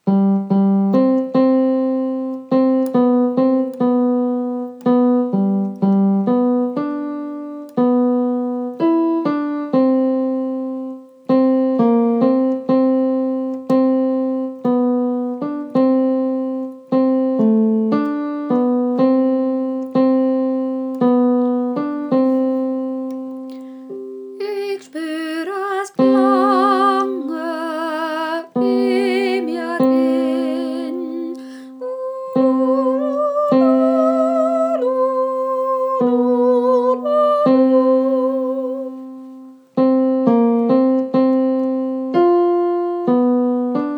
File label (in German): Tenor Klavier